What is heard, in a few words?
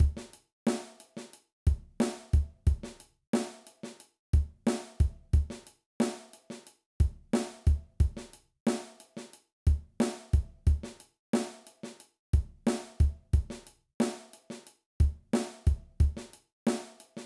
Groove, drum